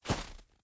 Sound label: Snow Foot Step; blue archive